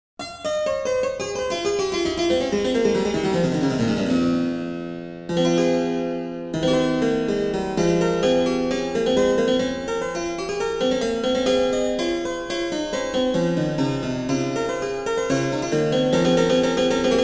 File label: Italian harpsichord III toccata; Pasquini; Toccata del sec-tono